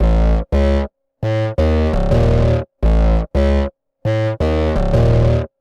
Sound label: Metahedz Bass; Sonic Foundry (Sony Creative Software); Knutrix Drum & Bass